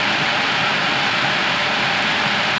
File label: TV Static; ambient; radio